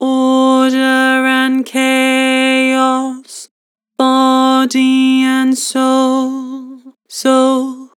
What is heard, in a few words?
Vibrato, Vocals; Order and chaos; Harmor Vocals